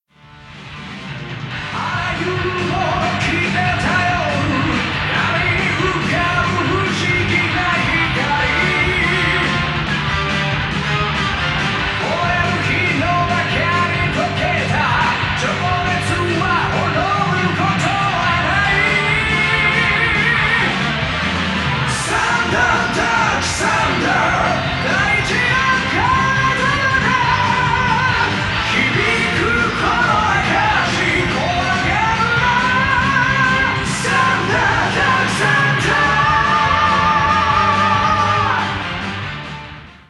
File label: Vocal ver.; yakuza kiwami b sides ps3 ps4 windows xbox one gamerip 2016